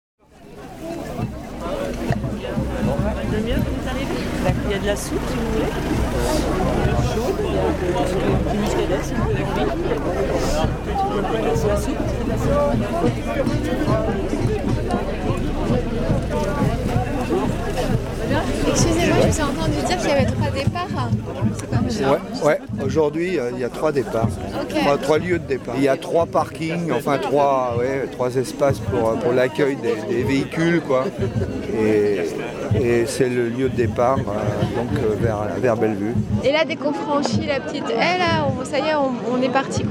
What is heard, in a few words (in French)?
Ambiance sonore sur la ZAD